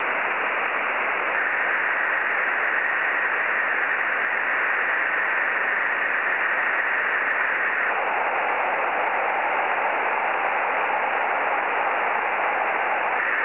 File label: beacon 24GHz; DB0AJA